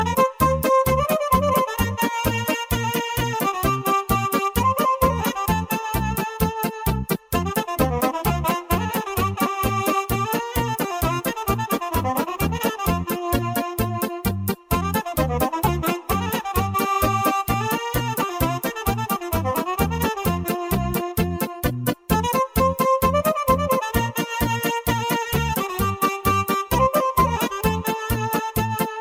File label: instr